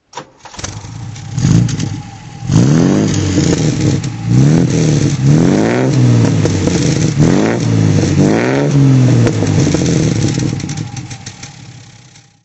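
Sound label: jensen 541 throttle, Engine sound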